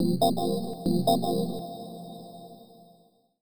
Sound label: TECHNO 140; Techno2